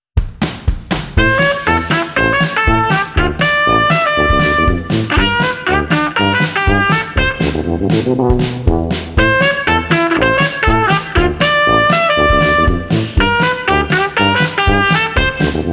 Trumpet Combo Oompa 120

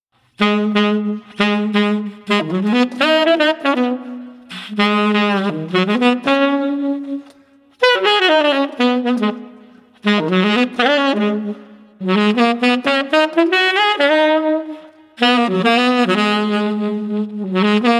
Sax Upbeat 116

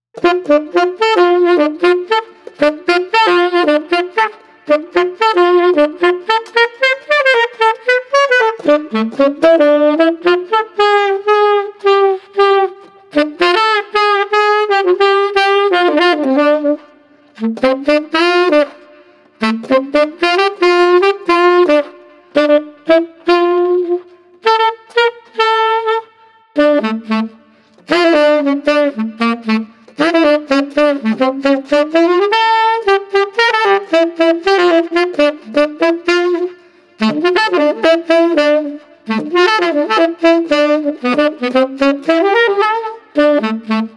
Street Sax Lighthearted

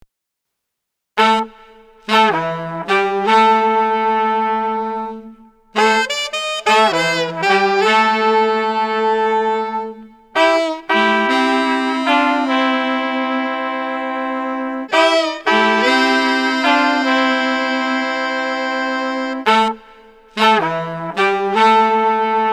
Street Brass Combo Twilight 105

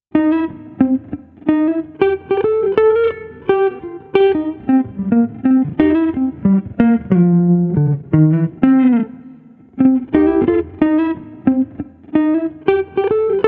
Guitar Funky Chill 90

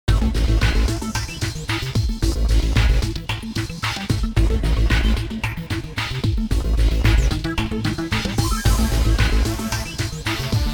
Robot

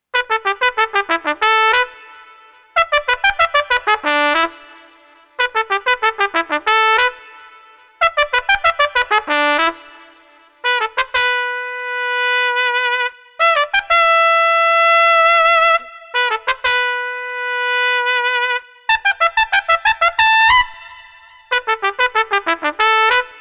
Trumpet Funky 120

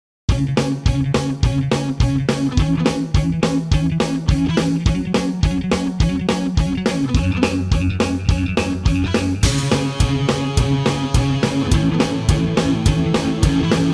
Pop Punk Instr

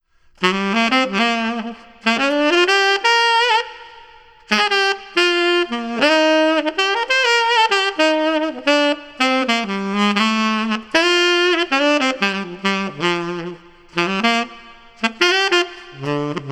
Street Sax NOLA Melody 116

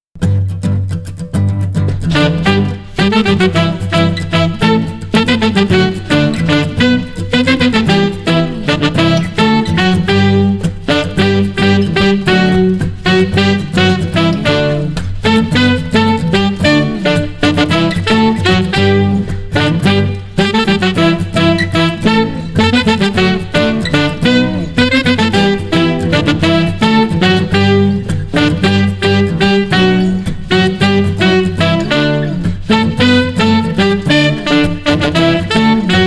We Wish You a Merry no Drums

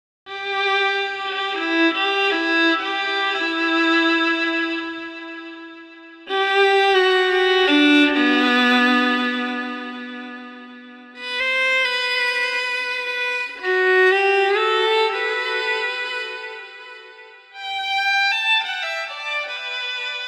Violin Somber 80

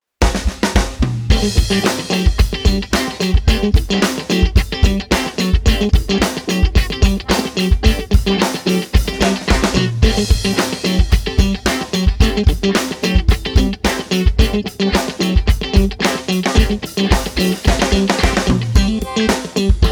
Street Bar Funk Rocker

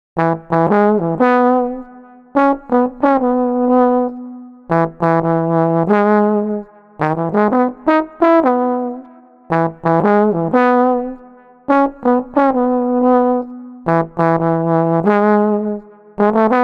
Street Trombone Hopeful 90

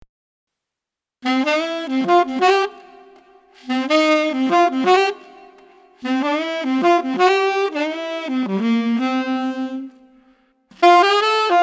Street Tenor Sax Expressive Blues 100

